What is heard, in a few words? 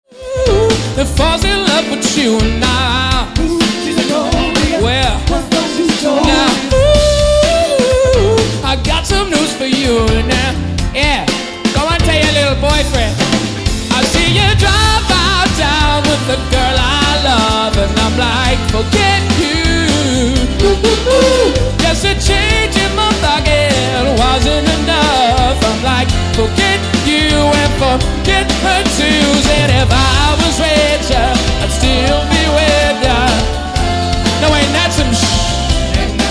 Soul Band; Surrey